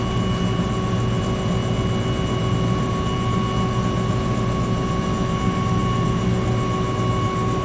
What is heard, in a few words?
blackhawk idle on ground loop